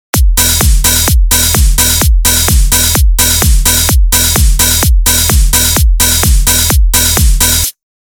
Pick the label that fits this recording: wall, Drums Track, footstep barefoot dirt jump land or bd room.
Drums Track